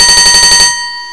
Ding; V43 Szemely

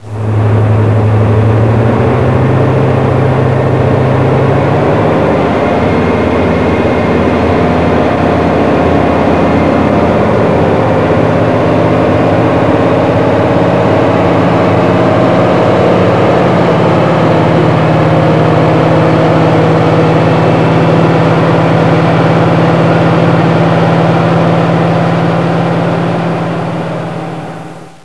motor, Train; OpenBVE; 55022 Royal Scots Grey

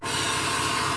Air, class 66 train; OpenBVE

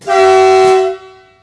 klaxon, Train; Siemens ES64U2 12 Car Pull

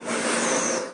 air zero, Train; R110B; OpenBVE

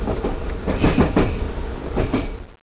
Point, train; OpenBVE

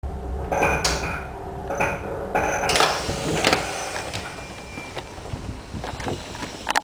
Door Open, Train; RBDe560

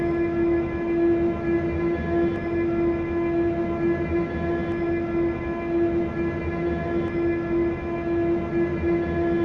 Motor, Train; OpenBVE

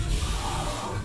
Air, Train; OpenBVE; EWII BDt

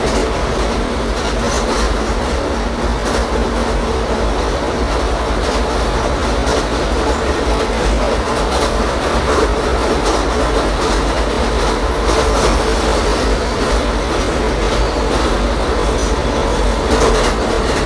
run, Train; R142; OpenBVE; Jerome Yard

